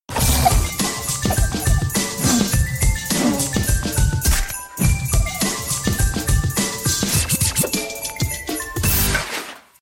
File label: Christmas Tune